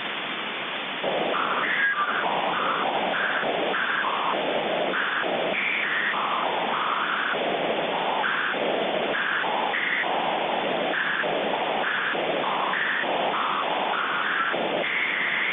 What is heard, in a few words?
Q65-30D AU QSO, aurora propagation, 144mhz; G7KTP; DD0VF